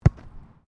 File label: SOCCER BALL KICK GROUND